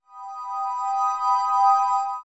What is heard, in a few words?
warp surface mono; OOT